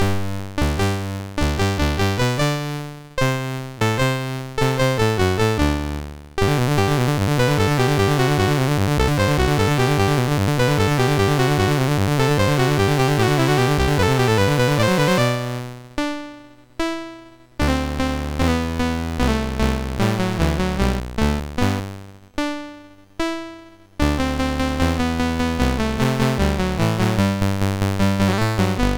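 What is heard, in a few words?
Maze, MOS 6581 SID chip music, games; hvsc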